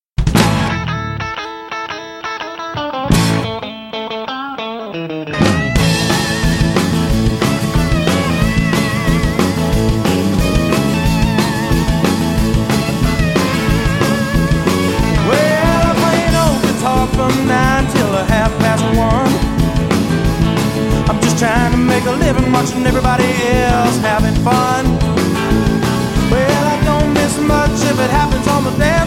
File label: rock country